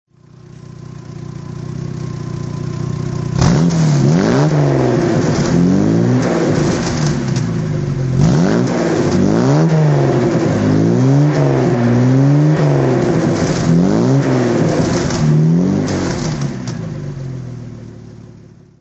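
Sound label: Jaguar mk2 3.8 throttle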